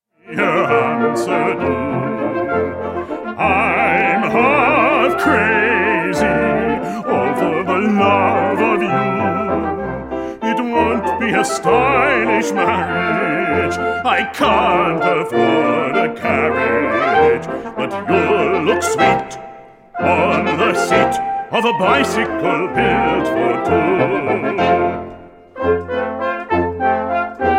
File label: for baritone piano and brass quintet; daisy daisy